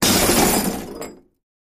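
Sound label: Large Amount Glass Break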